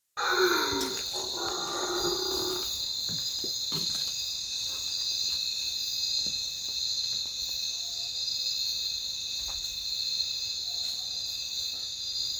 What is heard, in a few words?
Howler Monkey